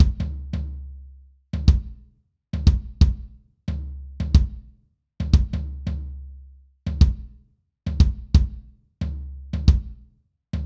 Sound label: drums kick tom